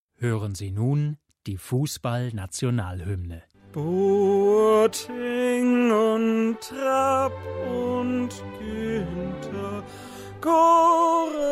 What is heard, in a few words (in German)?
die deutsche fussball nationalhymne